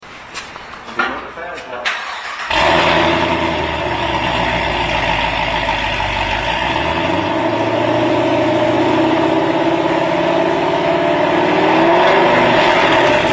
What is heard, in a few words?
GTO 6.0L Corsia Duals, exhaust sounds; SLPhedders